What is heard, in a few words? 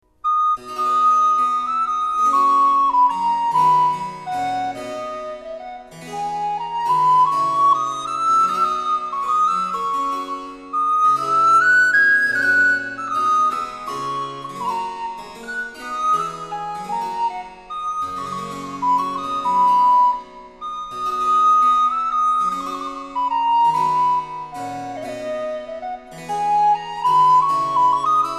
Country Dance; M.Locke